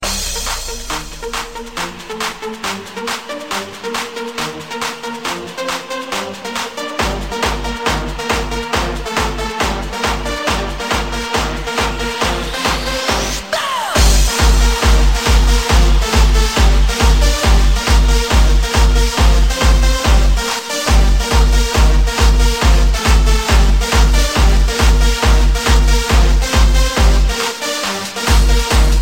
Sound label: Jumpstyle